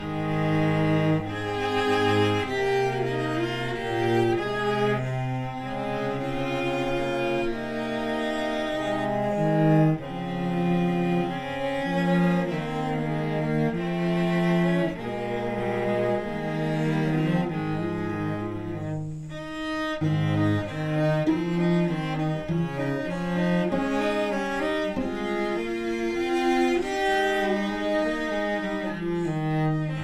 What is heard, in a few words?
Cello Trio